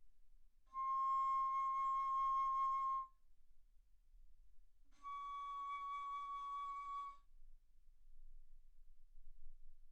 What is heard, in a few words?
Bass Flute ff C6 Db6